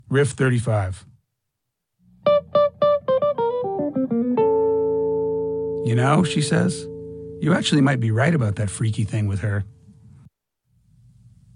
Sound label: Riff